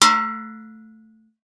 Metal Hit; 1001 Sound Effects

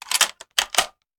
Rifle Foley; Sound Ideas; Guns

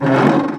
Creak Metal Ledge Open; SFX Kit

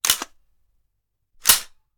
Machine Gun Foley; Sound Ideas; Guns

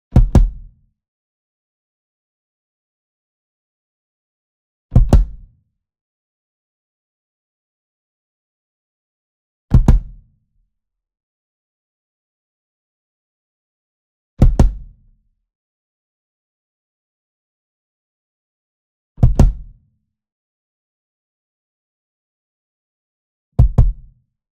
SMALL DRUM Kick Drum Double Hit Near; boom library; Cinematic Strikes Construction Kit

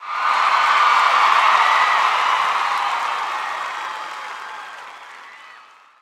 BMX Crowd Cheer Medium; Crowds

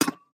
Peg Leg Footstep

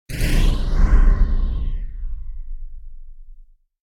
Whoosh Firing Phased; Hollywood Edge; sound designer's toolkit v2

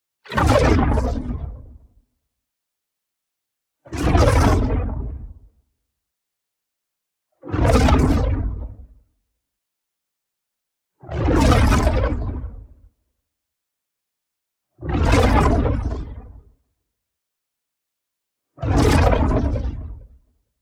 WHOOSH LARGE Epic Power Charge; boom library; Matter

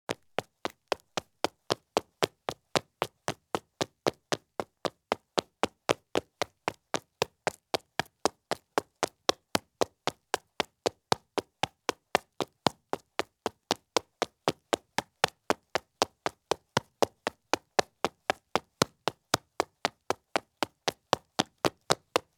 Men's Light Business Shoes Run on Concrete; Sound Ideas; Footstep Sound Effects

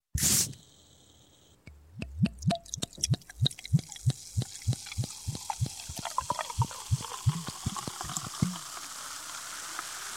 Bottle, Soft Drink Open, twist Cap, Pour And Fiz; Pour And Fizz; Sound Ideas 2000 Series

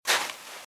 Footsteps Sand Dry Walk Right; RadwinIsland

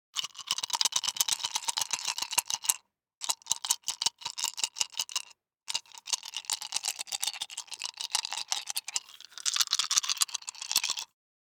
DICE in Two Hands, Shake, 6 Six Dice; Samples; ArticulatedSounds; DICE Sfx Party (Extended)

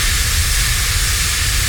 Steam Loop

